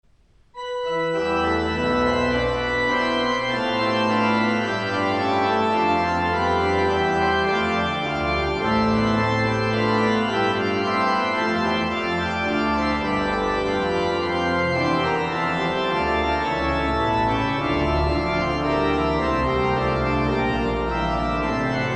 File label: Organ, Chorale Prelude; J S Bach; BWV 621